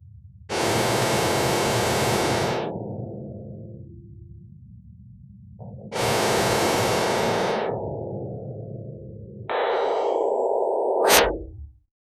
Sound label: sound of several images p, closure